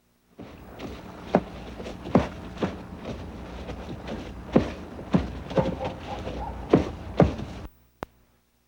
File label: Radio Sample; Fieldrecordings; Brasilien